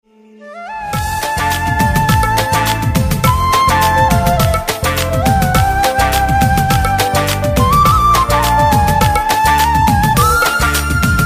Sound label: best kannada flute; classical